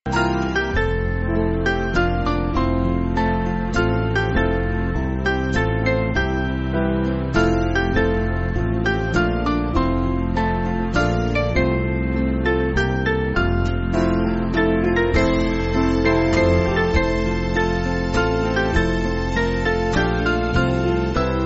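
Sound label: Band, Backing Files for Churches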